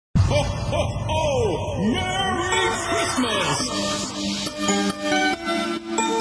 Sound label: merry christmas